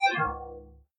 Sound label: Spacey Loose